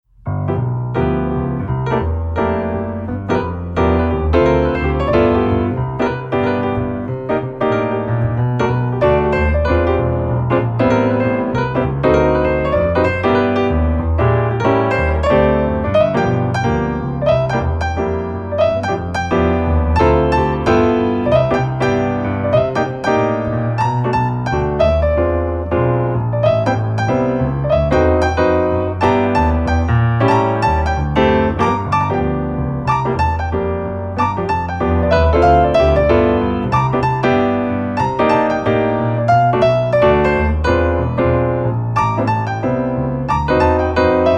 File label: Jazzbass Blues